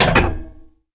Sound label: Bumper; blastmorph pinball; xbox series xs